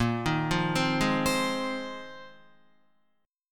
Bb 7th Suspended 2nd Suspended 4th Bb, Guitar 4F 4A 5C 5G 5C 6E; Bb7sus2sus4 arpeggiated